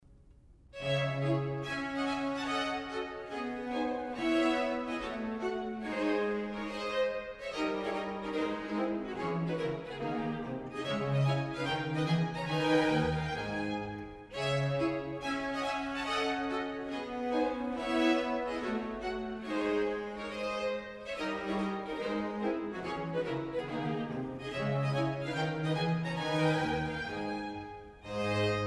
une gavotte

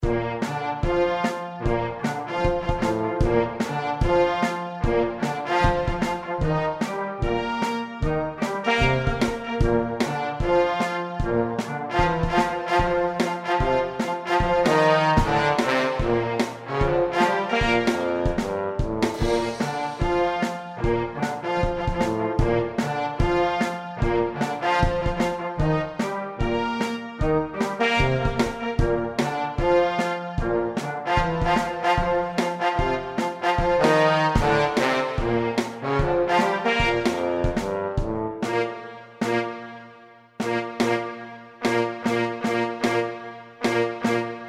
orchestre

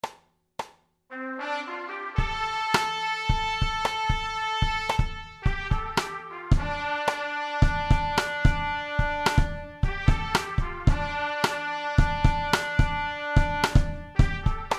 batterie

